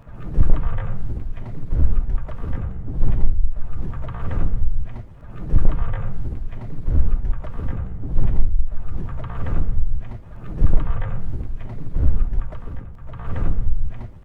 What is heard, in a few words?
windmill loop able louder